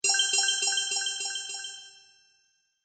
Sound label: Fairy Sparkle Delay, magic; fiction sound effects